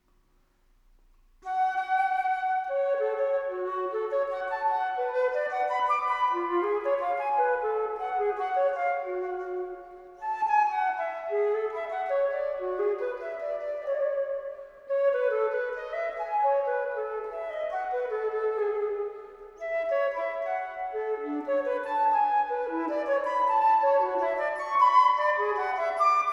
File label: Crystal Flute; Teleman Fantasia 10; Ringtone